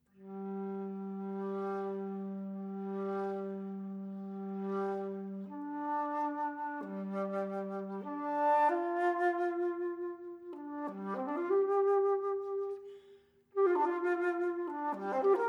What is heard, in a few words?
Spirit Man For Flute